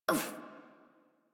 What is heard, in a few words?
Pain, Adult; Warframe; New War; Adult Operator